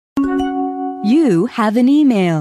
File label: email message alert